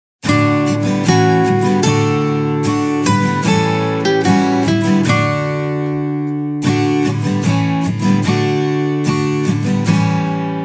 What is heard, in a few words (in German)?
lied abc die katze lief im schnee karaoke; ich und du; Schuelerzeitschriften